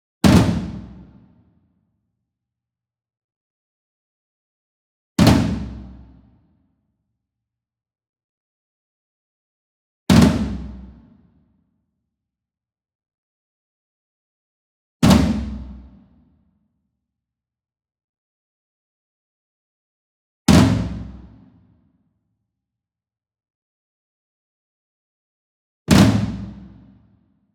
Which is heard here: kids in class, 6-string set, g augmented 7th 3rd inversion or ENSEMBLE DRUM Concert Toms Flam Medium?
ENSEMBLE DRUM Concert Toms Flam Medium